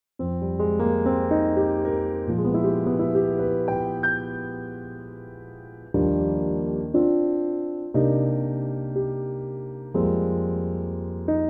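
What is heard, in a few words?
piano, male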